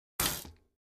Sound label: Biking Very Short